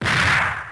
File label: Punch; RedDot